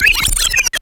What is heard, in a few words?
Scr Up; Mo' Phatt; Digital Sound Factory